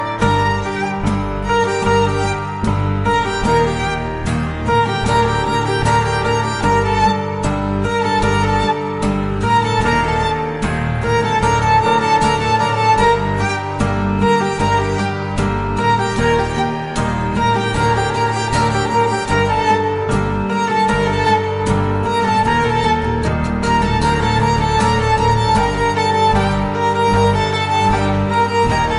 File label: Violin Piano